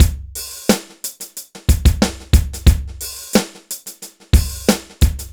BPM 90 DRY

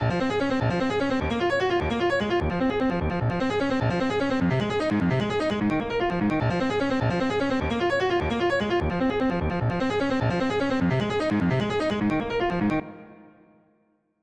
Artcore Piano Loop 300 BPM

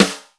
RIM, DRUM KITS, Hip Hop; InVision Interactive; 40 Oz Phat Slammin Hip Hop

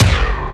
FX Wind Down

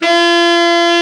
BRA TENOR, SAX; Best Service Gigapack 1; BR SAX 4

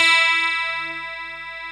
STRAT CH E4, EL.G; AKAI S-Series CD-ROM Sound Library VOL-2

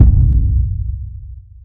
Lo Boom, Percussion Hits; Zero-G; Soundclash; Acidized Wavs

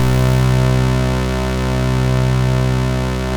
EMU MODULAR, modular syn

